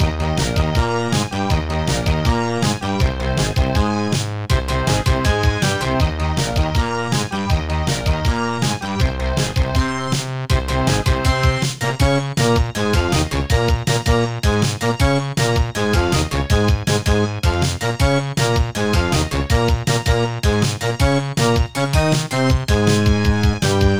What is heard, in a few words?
space menu; Fur War